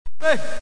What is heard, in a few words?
heugh